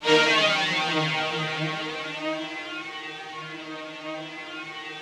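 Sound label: Yamaha VP-1, rough strings D#2; 90 s Sample CDs; Music Store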